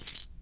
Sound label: Keys Drop